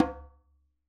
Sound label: djembe Single Hit Left Hand Tone; Raw Perc One Shots; Slate; SD SEISMIC Drum Sample Pack